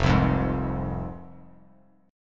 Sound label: F Minor F down Bass Standard; Fm strummed; JGuitar